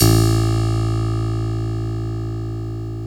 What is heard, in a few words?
HARPSICHORD A0; Greytsounds